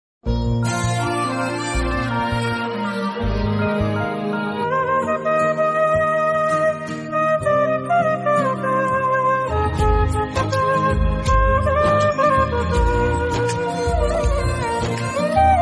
instrumental bgm

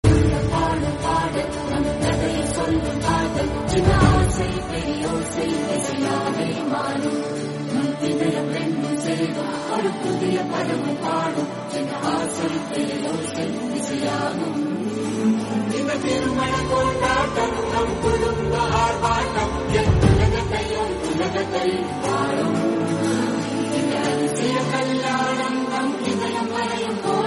hindi song; 2 states

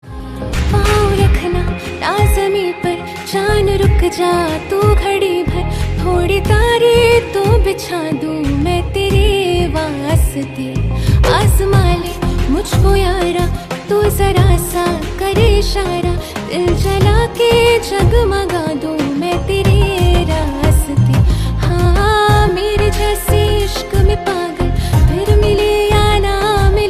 Female Version Ringtone

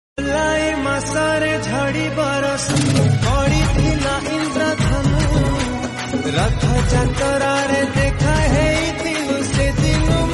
odia

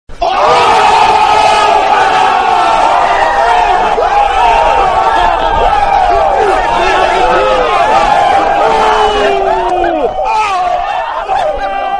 gunshot sound effect notification

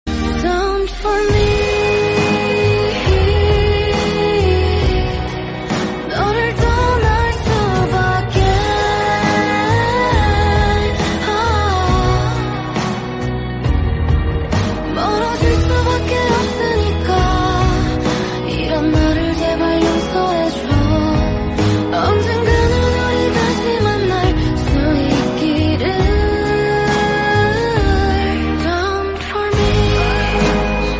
korean song; hotel del luna

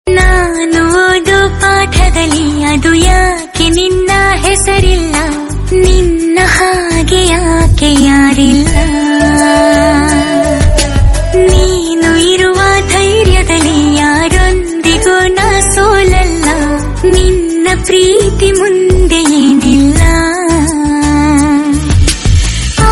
female version kannada

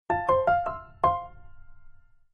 piano samsung galaxy s6 notification

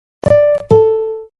phone pay transaction sound

